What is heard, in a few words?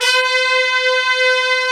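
trumpets swell; Roland LCDP06 Brass Sections; Tpts Sec.1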